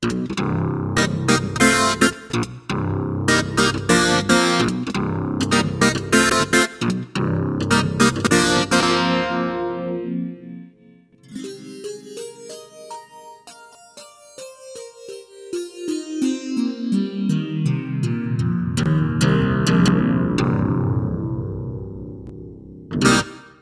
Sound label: Clavi Stuff